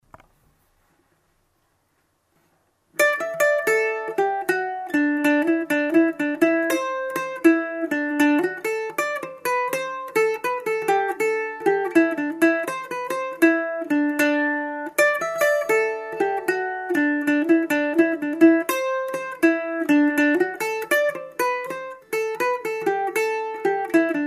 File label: Jig; The Pipe On The Hob